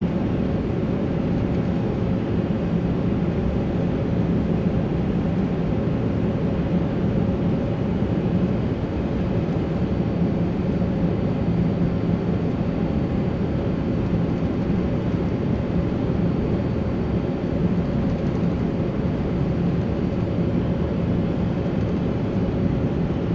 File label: ambient airplane